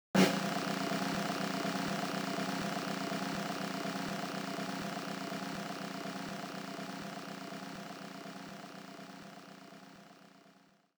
Level-Clear Roll; Picross 3D; NDS

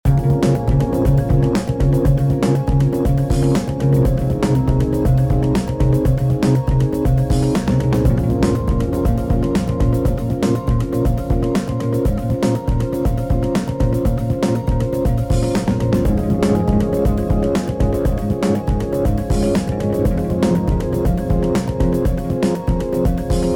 WATER LAYER, 120

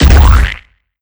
Pig Hit

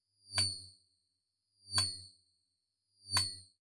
Button wait; miside demo windows gamerip 2023

